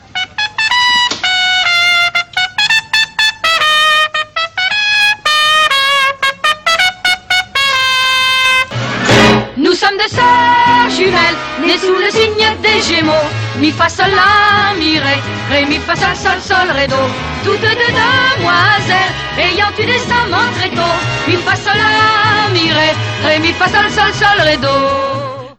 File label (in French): sonnerie; les demoiselles de rochefort